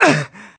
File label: Damage Fly; SSB Brawl; Link